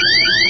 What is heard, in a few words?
Jackpot; 暴力赛车